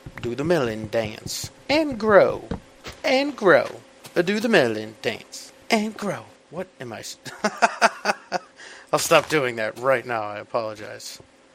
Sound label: melon dance